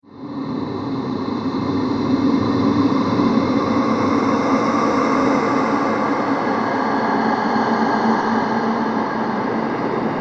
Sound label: Sinister Breaths Horror, Scary Breathing